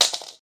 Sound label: item misc; RPG